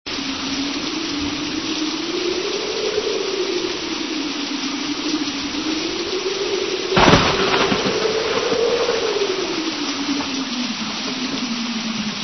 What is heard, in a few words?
thunderstorm